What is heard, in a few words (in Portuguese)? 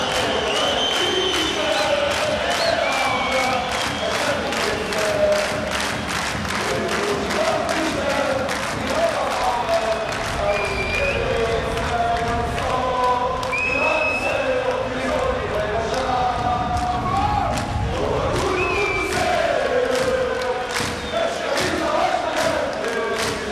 Chants, lisbonne